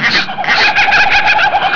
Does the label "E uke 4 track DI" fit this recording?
No